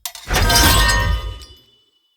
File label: Sword Draw; Weapons; Enemy; Swords; Mnd King Swords